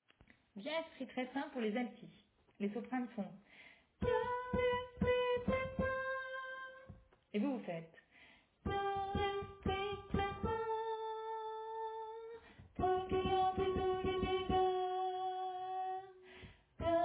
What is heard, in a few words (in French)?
Alto